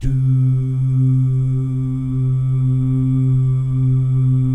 DOO GUYS, Vocal Jazz FX; Spectrasonics; Vocal Planet; JAZZ COMBO

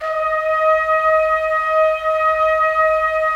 Trumpets; Roland - LA Composer vol. 4

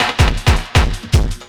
LOOP L, Drum & Bass 160 BPM

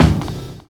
60 S BEAT; Univers Sons - Kitch 70s

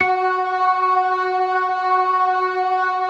DRAWBAR VIB, Synth and Organ

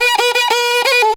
riti grooves; Best Service; World Colours

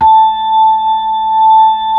B-3, Hollow

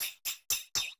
TAMB; Zero-G; Chemical Beats

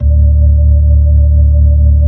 B-3, Brite

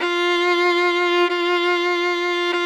VLN F3; Sonic Reality; American Heartland

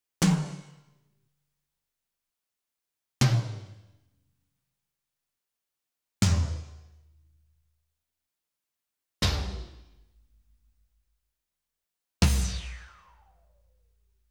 Tom 1-5 stick; Sound Sampling Collection VOL-5; Drums & Bass Fusion Funk Effect

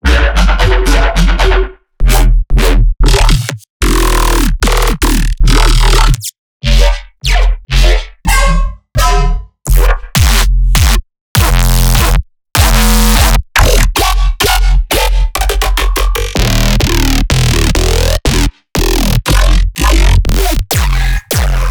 Bass Stabs

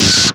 SCREAMFIRE, PHUZZ DRUMS; Spectrasonic Distorted Reality 1

